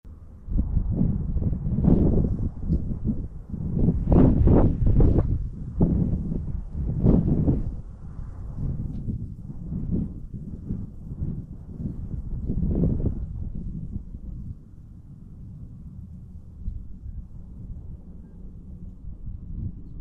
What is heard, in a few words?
winter wind